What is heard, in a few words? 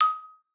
D#5, xylophone; Xsample VOL-4